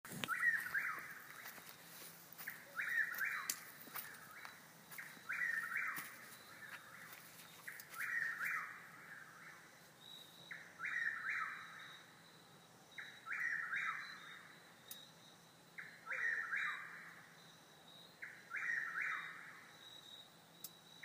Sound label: Chuck Wills Widow